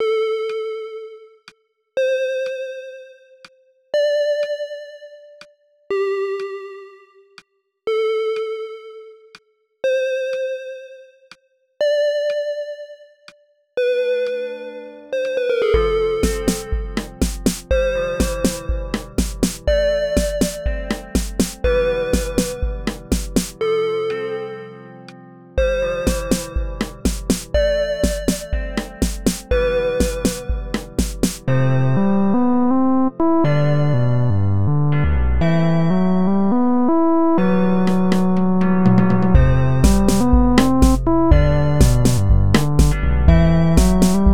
Chiptune

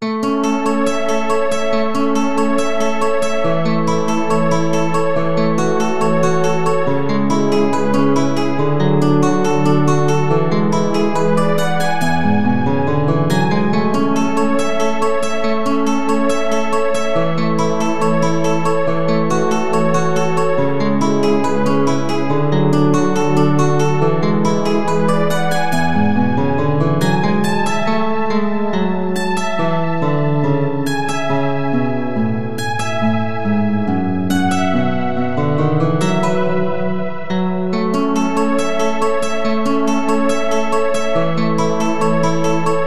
prelude in c major style atmos pad

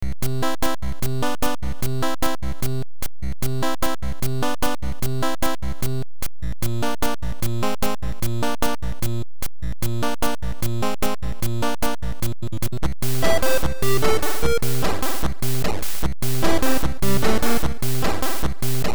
Overworld Chiptune Unlooped

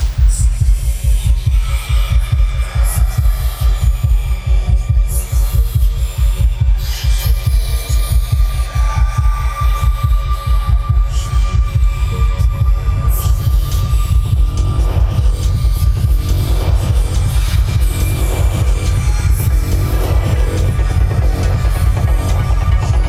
bitten by the rave spider

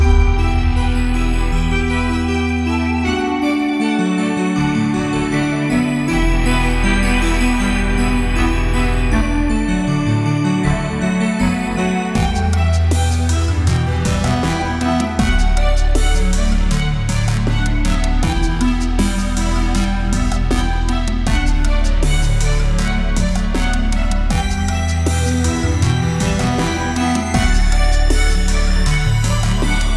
fields loop; remix

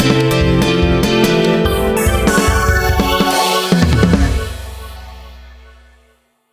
jingle

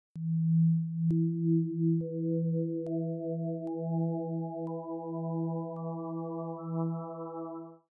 Alien light

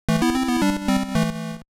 death jingle; Jay The Doggo

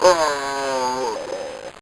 enemy death, bitcrushed voice one shots

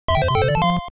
1up; Jay The Doggo

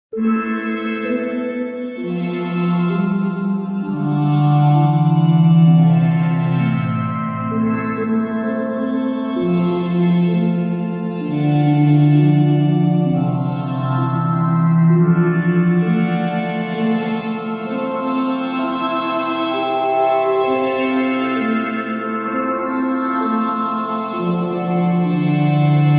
ocarina and organ; 2019 eclectic music pack

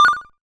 system menu select confirm

